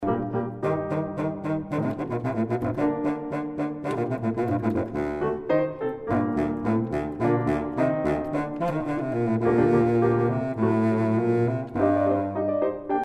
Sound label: Moderato; Presto; Moderato, Big Bassoon